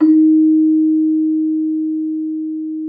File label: Celesta D#4; Xsample VOL-8